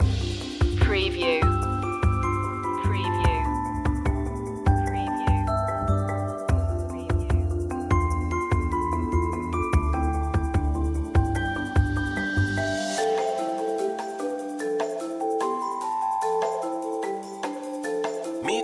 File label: flying home loop